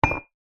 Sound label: mug cup ceramic set down on wood surface; household